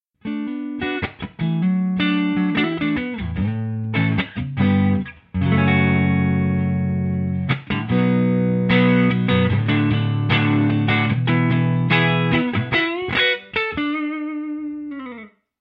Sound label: clean